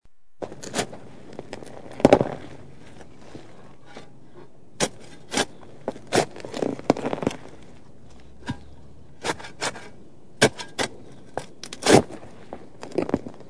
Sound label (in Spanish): Excavar tierra